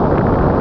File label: flood; Populous Demo